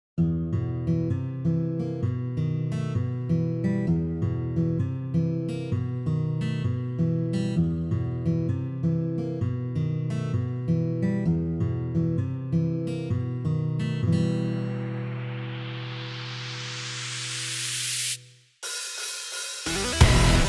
instrumental

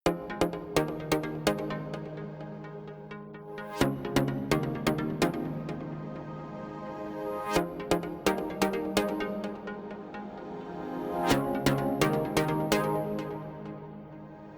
Instrumental

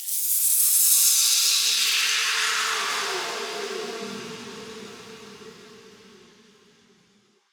FX; LiLA'c Records PsyTrance Sounds 01

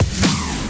Big Snare

